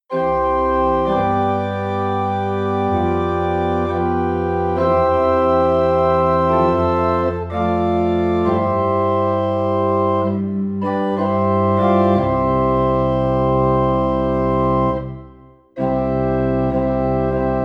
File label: Organ in C; O Holy Night; christmas